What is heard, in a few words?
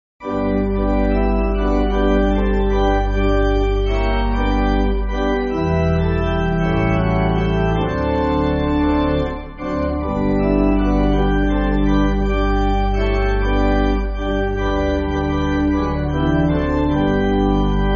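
Pipe Organ, Backing Files for Churches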